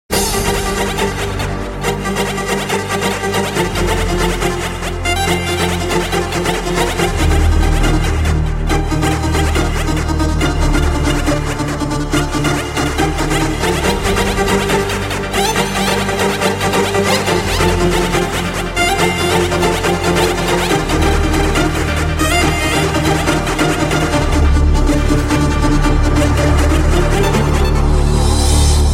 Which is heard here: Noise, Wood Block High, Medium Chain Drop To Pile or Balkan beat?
Balkan beat